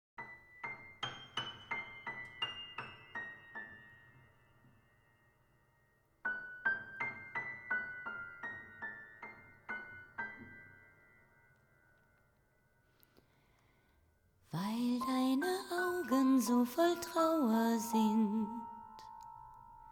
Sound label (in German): Kleines Liebeslied; mascha kaleko chanson